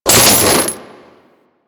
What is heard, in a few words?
Condemn Chains Beam; Warframe; Warframes; Priest